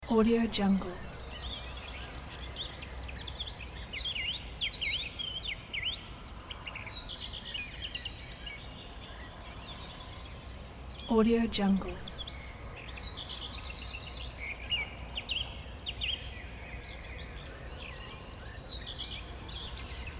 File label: village valley morning birds traffic in distance